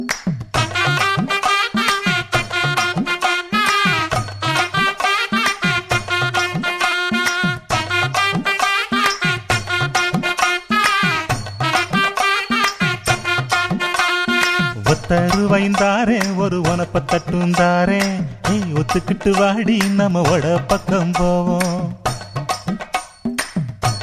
Tamil Gana

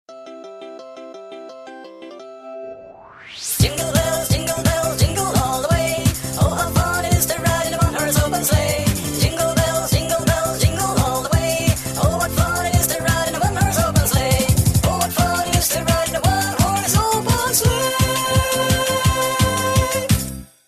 Jingle Bells

